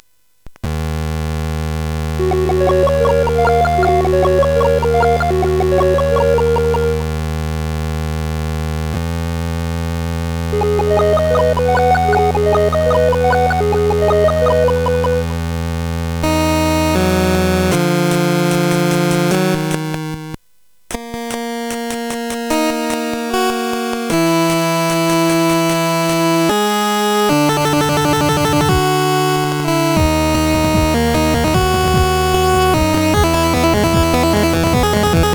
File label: Space Gun